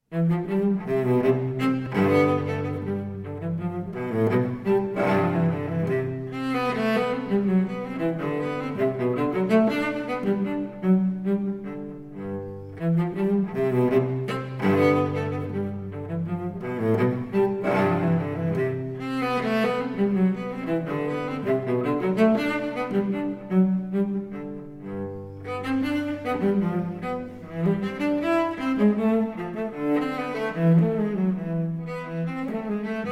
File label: Cello Suite in C Major, Bourrée I - Bourrée II; Cello Suite No. 3; BWV 1009 V.